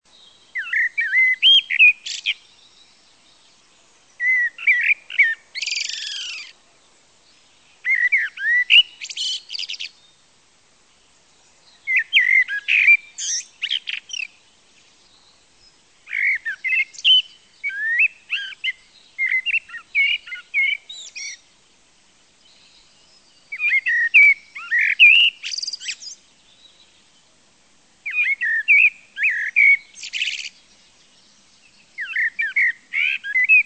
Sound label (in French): merle noir